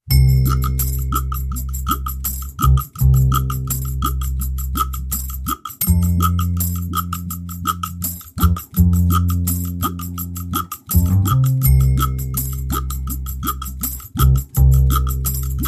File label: Drums and Bass Mix